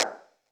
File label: Claps, Elektron MachineDrum SPS1 MKII